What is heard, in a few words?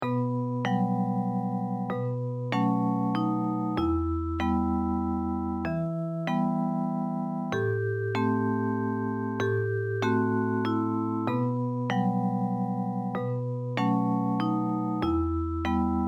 simple waltz